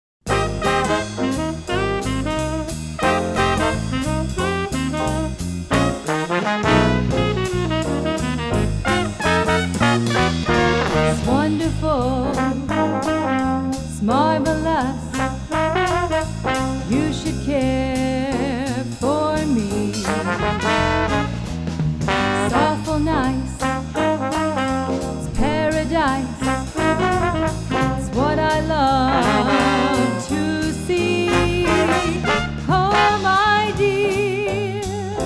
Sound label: vocal